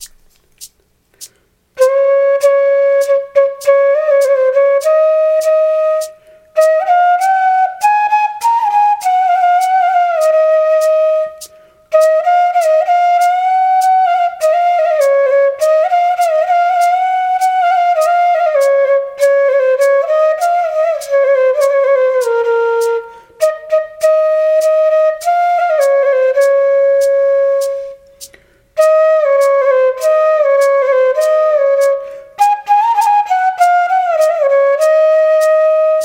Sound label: Om Jai flute, Bansuri; Bansuri Student Zone